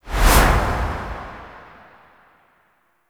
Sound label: Foundry Whoosh In; Interior; Ambience; Warframe; Nineteen Ninety Nine; Tailor Shop